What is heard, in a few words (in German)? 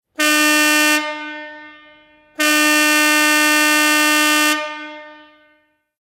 truck hupe